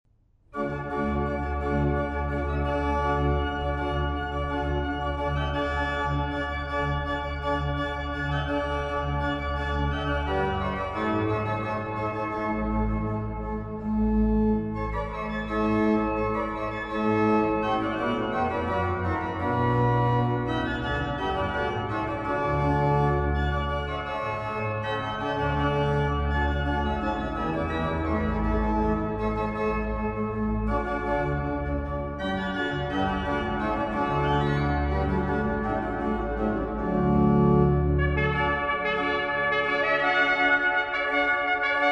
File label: D maj; Tartini; Mvmt1